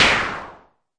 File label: Arturia MiniBrute noiz